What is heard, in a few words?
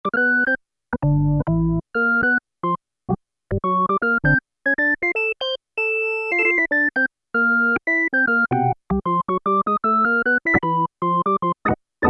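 jazz organ, Kawai SX-240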